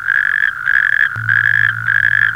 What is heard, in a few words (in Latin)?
bufo calamita; voice